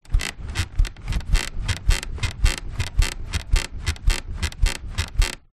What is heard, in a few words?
household mattress movements bounce creak